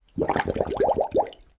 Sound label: drain bubbling liquid gurgle emptying drain; prosoundfx